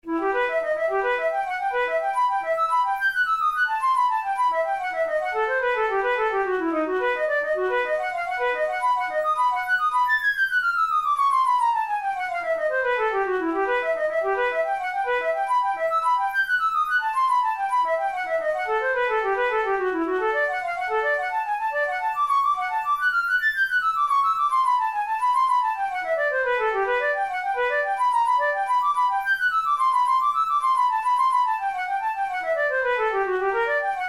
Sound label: etudes, flute